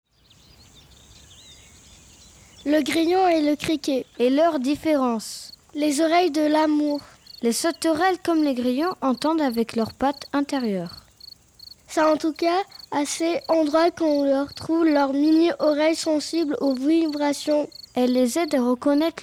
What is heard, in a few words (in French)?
Grillon et criquet avec ambiance